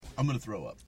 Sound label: Throwup